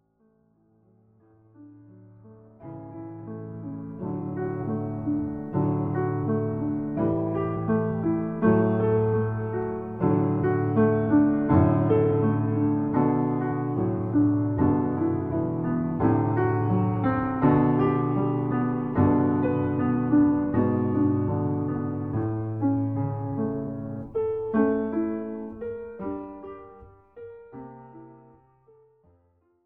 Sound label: LOW VOICE; brahms piano